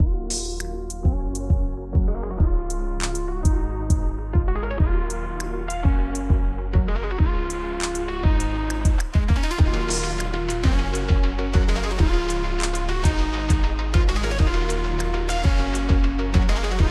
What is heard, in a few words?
instrumental